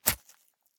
Gore Cut Slice

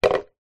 foley plastic tub small down on hard ground; zapsplat

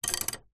foley wood skewer x1 drop on wood surface; zapsplat

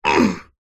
human male fight vocalisation grunt; zapsplat

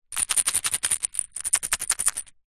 medicine vitamin b 12 tablets shake glass jar

